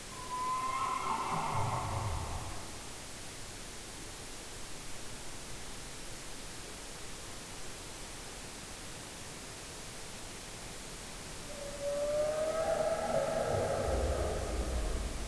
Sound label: ambience creep